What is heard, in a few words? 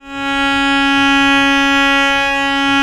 C#3, Historical Instruments, VIOLE; USB Soundscan vol.23